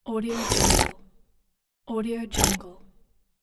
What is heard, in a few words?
slurp